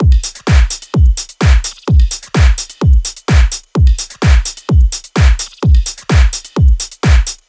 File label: drums 128, Full Drum Loops; drum sample pack; Nantai; SEISMIC; Slate